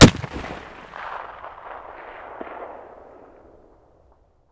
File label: rem700 outdoor distant; Escape from Tarkov